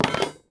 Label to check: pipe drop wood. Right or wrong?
Right